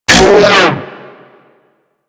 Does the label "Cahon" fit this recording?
No